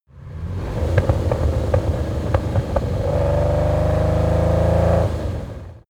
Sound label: car lamborghini countach small accelerate clatter